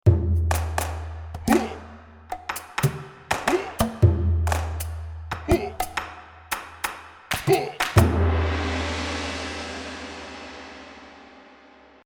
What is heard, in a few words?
Track for Crunchy